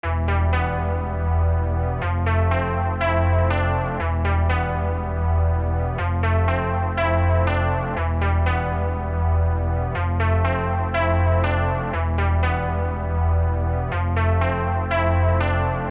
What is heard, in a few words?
LOFI CHORD